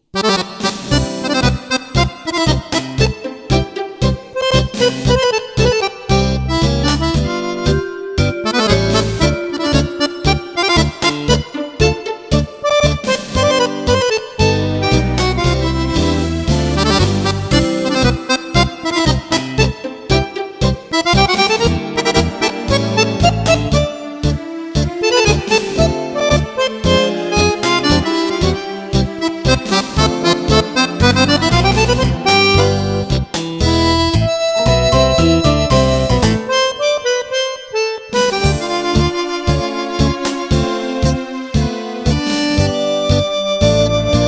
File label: tango